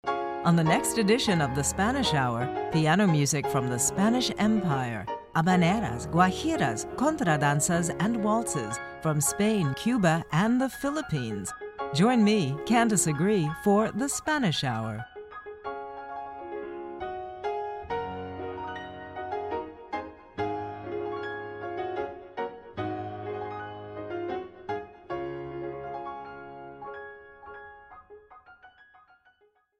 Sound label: piano miniatures from spain cuba and the philippines; this week on the spanish hour